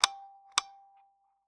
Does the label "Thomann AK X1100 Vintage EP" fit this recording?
No